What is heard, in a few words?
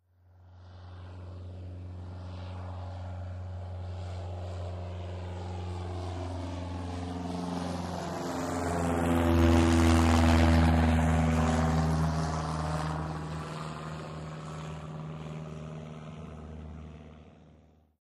Airport Bg W Propelle